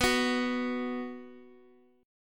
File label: B 5th B down Guitar Drop D